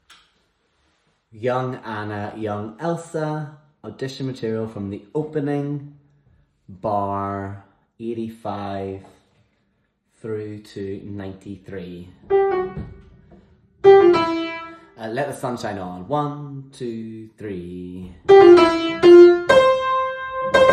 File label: Audition Backing Opening; Young Anna; Hidden Child; Disney's Frozen